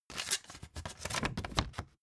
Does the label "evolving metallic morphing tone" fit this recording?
No